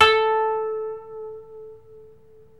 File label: KOTO MILD